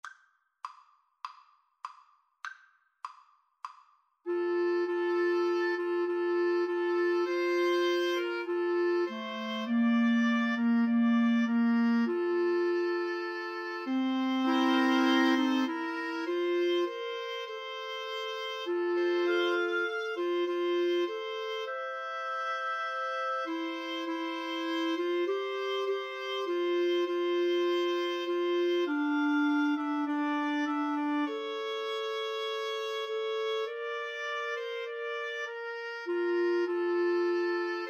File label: Woodwind Trio; fl, ob, cl; Fischer, William G - I Love to Tell the Story